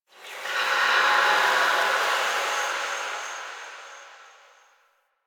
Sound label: Whisper Air Draft; Warframe; Quests; The New War; Ambience; Zariman